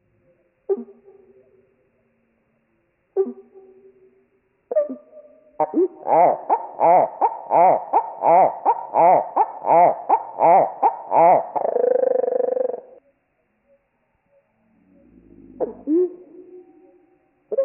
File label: slowed 8x West genes West songs, Marsh Wrens; Donald Kroodsma